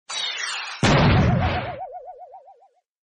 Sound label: bomb